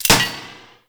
Axe Release; Warframe; Weapons; Tenno; Sundial

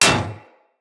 Bullet Impact Generic Enemy Shield; Weapons; Surface

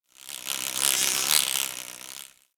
Forest Close Insect Buzz Fly By; Warframe; Grineer

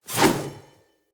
Spear Throw; Weapons; Player

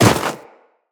Run Snow, Footsteps; Tenno

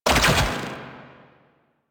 Democracy Fire Start; Warframe; Caliber Chicks Minigame; Weapons

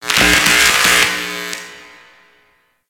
Corpus Infested Light Flicker; Warframe; Ambience

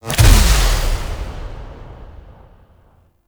Grimoire Alt Impact; Warframe; Tenno

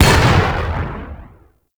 Throwing Knive Sticky Bomb Explosion; Warframe; Tenno

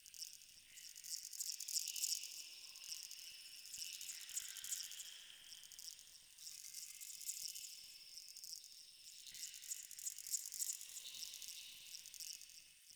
Critter Ambient Stereo Loop; Warframe; Tau; Ambience

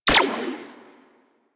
Shot Fire, Tommy Gun; Tenno; Stradavar Prime

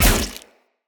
Sphere Polearm Medium Impact Flesh; Weapon Impacts; Player; Combat

